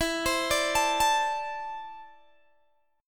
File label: E 7th Suspended 4th Sharp 5th, Guitar, Open G